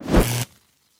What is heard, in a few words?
stunstick swing; weapons